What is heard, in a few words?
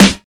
2000s tight low end snare drum g key, acoustic snare tuned to the G note; snare drum samples